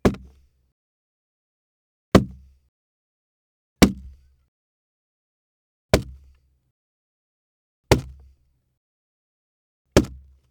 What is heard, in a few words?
Golpes Hits